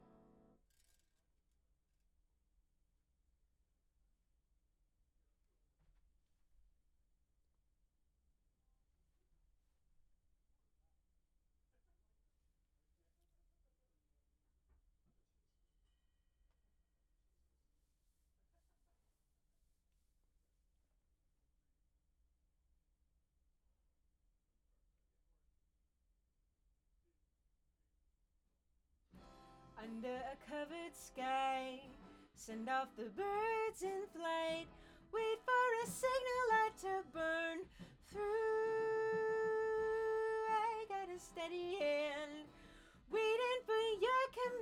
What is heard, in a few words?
Act Guit R